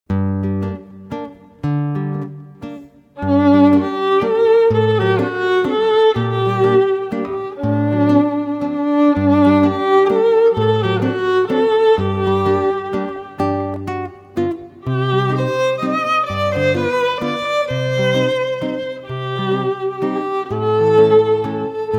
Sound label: Duo of Guitar & Violin